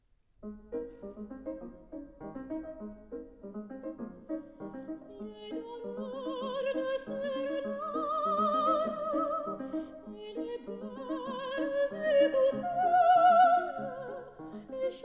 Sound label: song for voice & piano